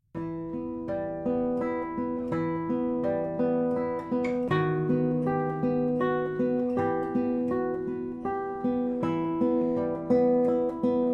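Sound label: Waltz Solo Guitar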